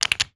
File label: question place